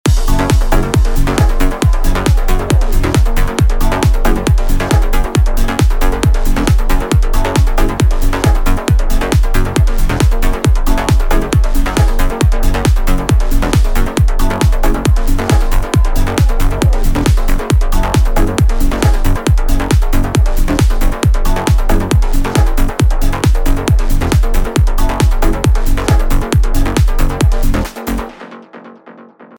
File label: bass; luscious bass soundset